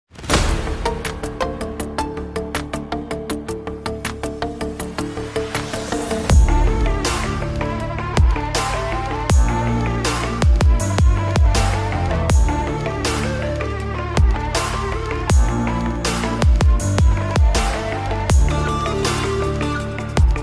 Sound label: BGM Boss Fight; ToothyV